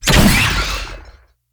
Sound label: ancient sword